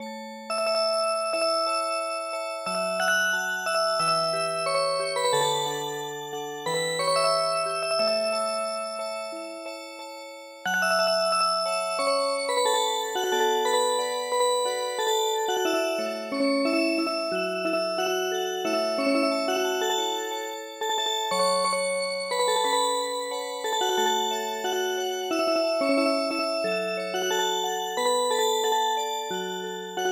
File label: yodo no kawase Music Box